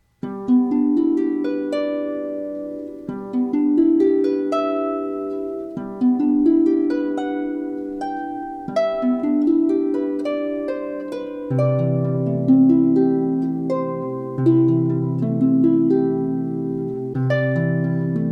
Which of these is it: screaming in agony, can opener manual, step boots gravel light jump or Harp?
Harp